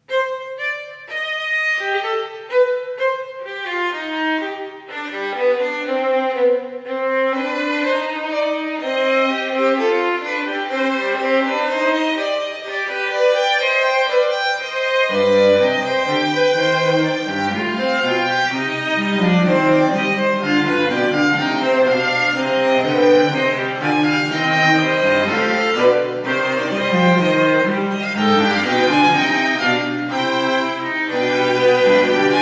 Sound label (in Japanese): オルガン小曲 ハ短調; concert from umineko when they cry for clarinet quintet and piano